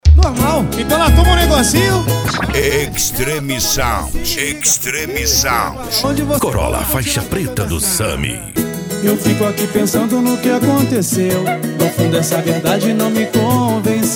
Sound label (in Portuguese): pagode e sertanejo; xtreme sound